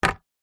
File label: foley greeting card place down on wooden surface